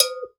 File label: GANKOKWE, African Percussion; E-MU Producer Series Vol. 7 – Old World Instruments